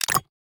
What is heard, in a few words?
exchange complete; coup